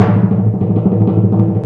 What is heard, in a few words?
TYMPANI ROLL; Greytsounds VOL-1; ORCH PERC 1